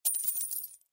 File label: Collect coin